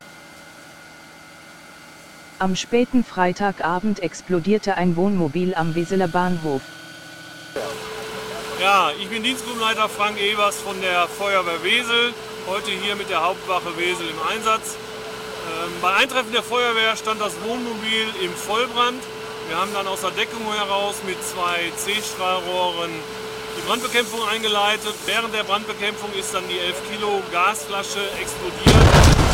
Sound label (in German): Explosion Wohnmobil